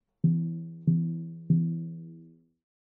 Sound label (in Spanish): timbales